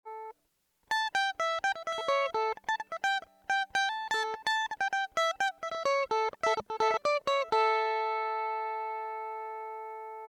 mandolin comparison electric

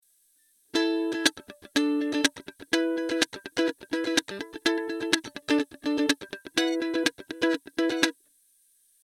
mandolin comparison electric chords

